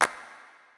Clap, Drum Percussion Single Hits; Zero-G; Nu Disco

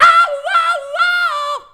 "AUAUAUAUH", SHOUTS; Best Service; Hyper Dance

